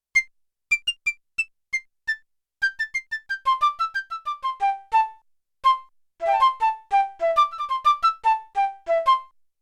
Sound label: Stopped Flute, Yamaha TX16W; Orchestral Performance 1